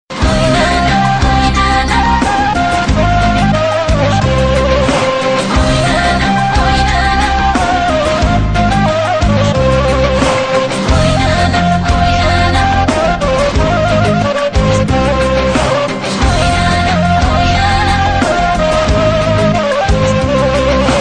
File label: Albanian Mix